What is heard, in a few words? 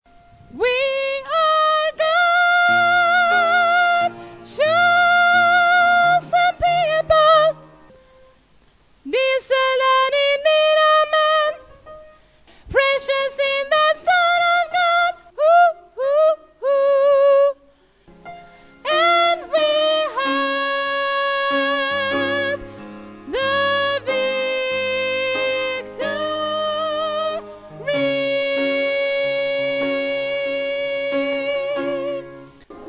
tenor